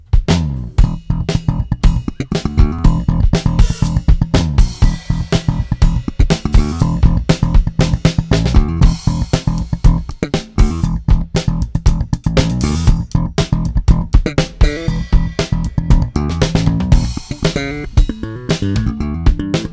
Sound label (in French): NOUVELLE BASSE BIEN; Repetitions; Degât des Eaux